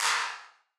Wider Clap; stereo toolkit samples

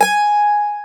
mandolin, Stereo Mandolin; E-MU Producer Series Vol. 2; More Studio Essentials; Composer

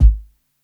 KICK SOFT, drums; BASEMENT DRUMS VOL 2

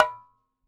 Requinto Hit, Latin Percussion; Sonic Foundry (Sony Creative Software); Joe Vitale Latin Percussion

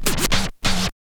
scratch kit, vinyl; Masterbits; Vinyl Attack

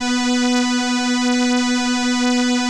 ELKA, String Machines; Best Service; XX Large Most Wanted 2 String Machines

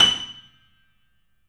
F#, 3D Pianos, Boesendorfer

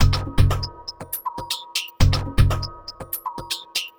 Mod Loop, Modular Percussion

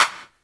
CLAPS HOU; Best Service Dance Mega Drums

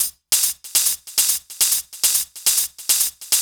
Hh Loop, Drum Loops 140bpm; Zero-G Trance Inducer

